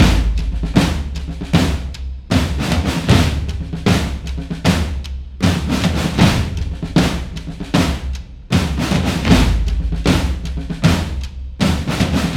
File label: Drums; For Honor